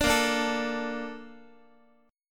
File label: A sharp Major 7th, Guitar; strummed